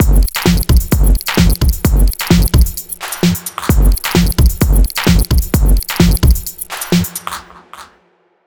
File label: Kit Pattern 130; Squid